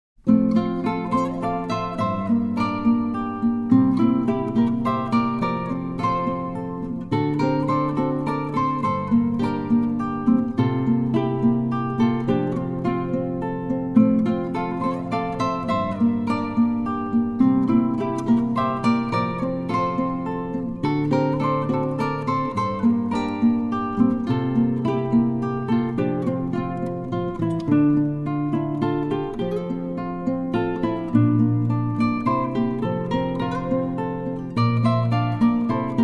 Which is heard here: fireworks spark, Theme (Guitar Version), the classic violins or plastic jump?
Theme (Guitar Version)